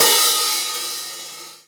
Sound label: Open Hihats, Cymbals; Vengeance Samples